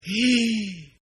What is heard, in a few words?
Gasp